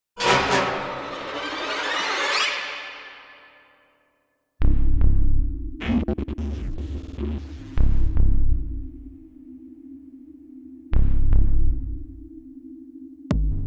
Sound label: Stinger